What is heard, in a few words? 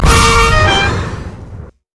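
overdrive; piltover customs blitz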